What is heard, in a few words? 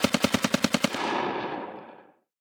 combat atmosphere; World of Tanks